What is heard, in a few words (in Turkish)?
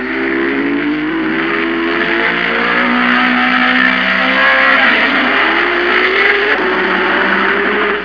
araba motor; tasitlar